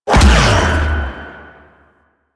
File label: Whirlwind Dash Empowered Impact; Warframe; Caliban